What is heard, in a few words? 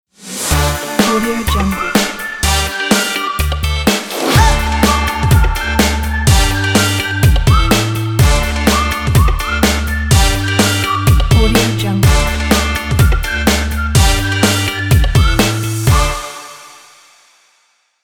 action advertising intro